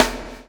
CHAIN; Spectrasonics - Backbeat

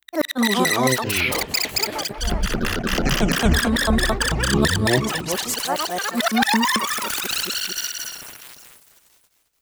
Abduction, Vocal Samples, Sound Design; Sonic Foundry (Sony Creative Software); Pocket Diva World Class Vocal Samples

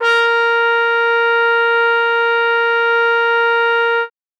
trumpet A#4, Trumpet forte; Session Instruments

